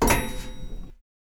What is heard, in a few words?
lift; CosmicRage